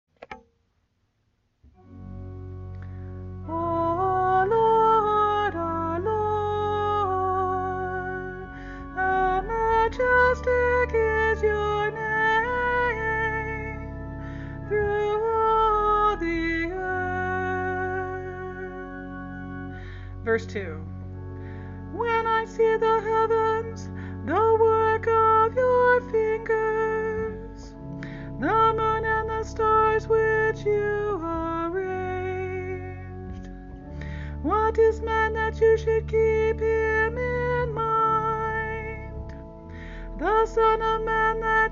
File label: Communion Antiphon; 29th SUN OTB